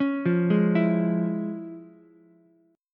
F Minor Major 7th Sharp 5th Ukulele; FmM7#5 arpeggiated; JGuitar

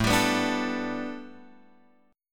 A 7th Suspended 2nd Suspended 4th Guitar Open F; A7sus2sus4 strummed

